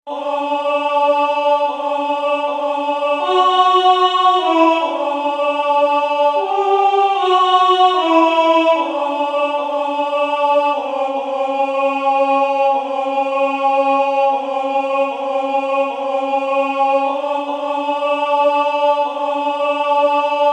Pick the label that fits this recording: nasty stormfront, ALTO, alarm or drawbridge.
ALTO